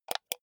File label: computer mouse wheel click